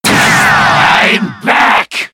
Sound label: teleport; freak fortress 2